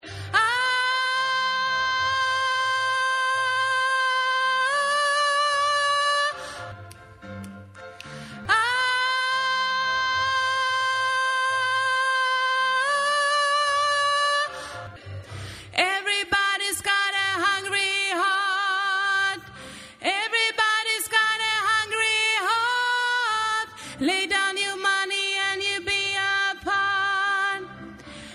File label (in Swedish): bas